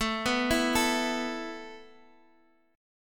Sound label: B 7th Suspended 4th A